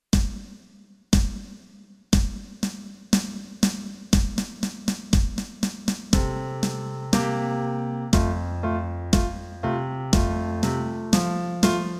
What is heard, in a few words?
Duke in Eb; brass; backing tracks